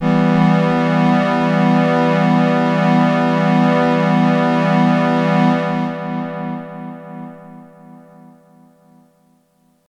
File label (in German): Synth Delay und Pan; TC Electronic 2290 P